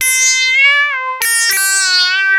Arp E Line, ARP Odyssey; musicradar-synth-samples

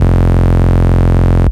Root F, Bass; Vengeance Samples; Vengeance Ultimate Bass

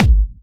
BD Punchy; Vengeance Samples; Bassdrums

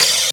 Open Hihat; Cymbals; Vengeance Samples

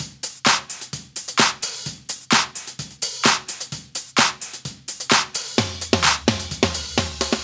129BPM Hiphouse Kit

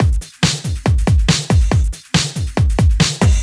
Loops BB 140, BreakBeats; Vengeance Samples

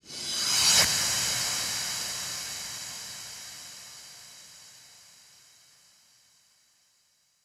Reverse FX 140BPM; Sound FX; Vengeance Samples; Selection Kit; E minor

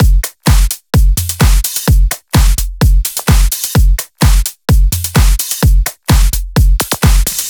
Neon Drums 2, 128BPM; Vengeance Samples; Neon Kit

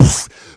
Beatbox Snare, Human Beatbox

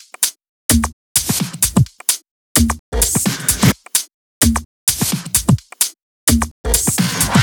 129BPM Kit; Vengeance Samples; Micromax Kit

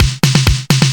Fill Ins 128BPM; Vengeance Samples

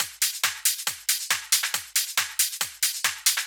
Loop 138BPM, Drums & Perc; Vengeance Samples; Freefall Kit; E minor

